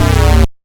Sandblaster C0, synths; Multisamples; Ableton Live 12 Lite; Core Library

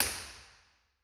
Early Reflections, Impulse Responses; St. Saviour Jerusalem BA Mid L; Ableton Live 12 Lite

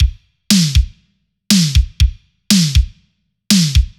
80s Drum Machine 120 bpm; Loops; Drums; Wingman; Ableton Live 12 Lite; Core Library

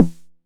Snare 909 Tune 10c, drums one shots

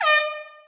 game countdown; There; ThereClient; gamekit; gunkit

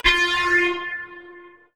Siren Alarm Charred

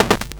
FX Buzz Stutter; One Shots; Drums; Runaway; FX Hit; Ableton Live 12 Lite; Core Library

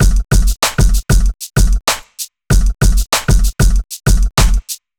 Dirty 96 bpm, Drums; Loops; Neptune; Ableton Live 12 Lite; Core Library

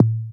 Tom Analog; Drums

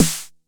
Snare 909; Multisamples; Drum Machines; Tune9; Ableton Live 12 Lite; Core Library

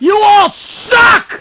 got hit; Digital Paintball 2

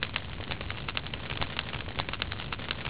fire torch; graal2001

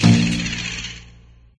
hovercraft celebration; There; ThereClient

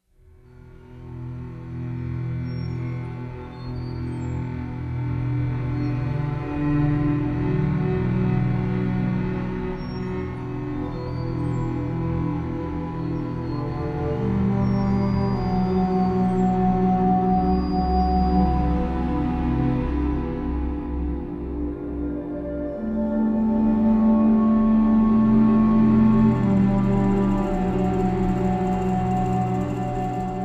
score Desert; Journey

